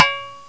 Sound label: clang; SFX; Sound Library 2000; Wayzata Technology